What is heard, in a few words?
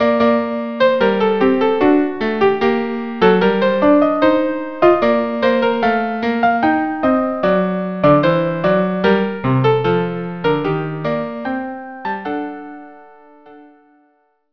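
dances violin viola; 4 dances almira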